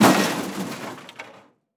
Crash Metal And Wood; crashes; AdobeCC

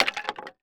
Impact Wood Broken Chair Drop On Cement Floor

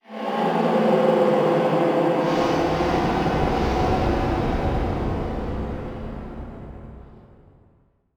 Title Transition Stab Dark Demonic; Production Element

